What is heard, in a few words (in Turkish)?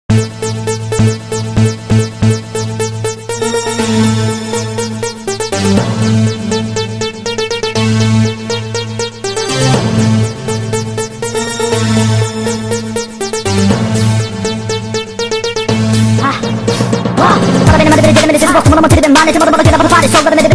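dünyanın en hızlı rap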